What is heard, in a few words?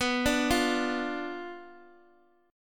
B Diminished B, guitar